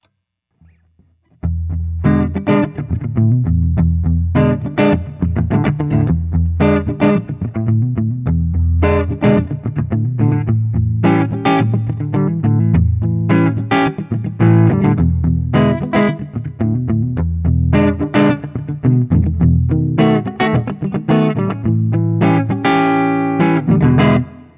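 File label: Guild X700 ODS100 Open 7th, guitar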